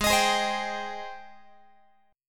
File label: G sharp Major 7th G sharp, Guitar; G#M7 Chord